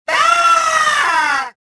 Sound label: death, rodian